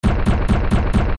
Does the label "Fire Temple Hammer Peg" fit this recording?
Yes